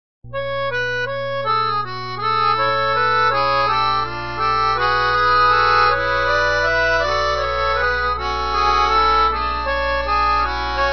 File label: short piece pianica